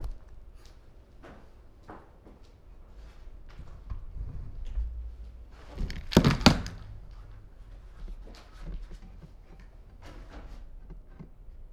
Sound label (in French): rail alarme